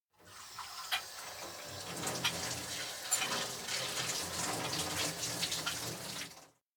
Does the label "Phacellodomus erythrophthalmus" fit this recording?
No